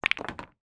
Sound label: sport pool snooker multiple balls hit one potted